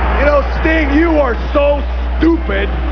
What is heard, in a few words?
sting